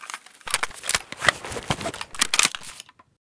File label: bizon reload